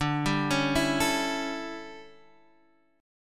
D Major 7th Suspended 2nd Suspended 4th D, Guitar, Half Step Down